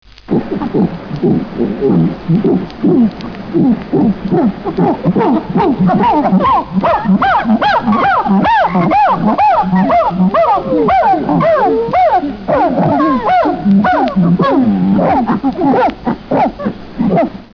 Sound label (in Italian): oranguta